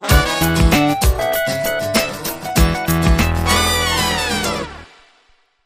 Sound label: Urgent Call, Sting